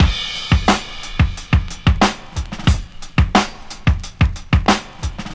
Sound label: 90 bpm 00s drum loop c sharp key, Free drum beat - kick tuned to the C# note. Loudest frequency: 1107Hz